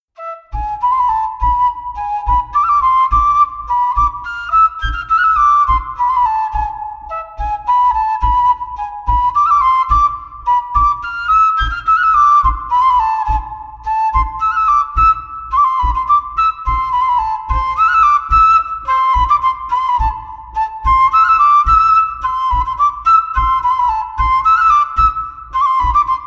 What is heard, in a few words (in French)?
Montagnarde; Claussmann